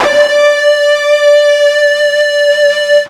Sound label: FUZZ D 4, ULTRA FUZZ, Guitar; East West; Steve Stevens Guitar Samples Collection